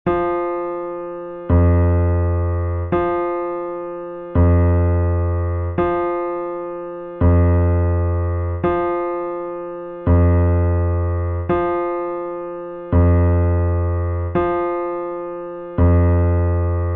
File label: impulse heel; note resonances